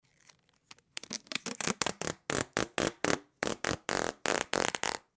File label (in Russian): zvuk skotcha